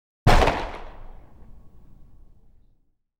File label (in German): detonation; geraeusche digital